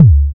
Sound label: BD ANALOG, Synth; Sound & Vision - Gigapack II CD 2